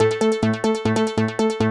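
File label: synth electro 140 A; keys; Techno2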